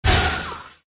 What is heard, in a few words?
boss lich fireball; Adventure Time - Break The Worm; ThrillGames